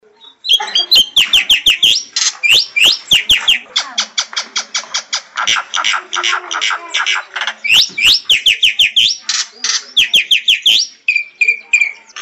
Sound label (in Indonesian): suara asli jalak putih